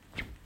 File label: Sword Swing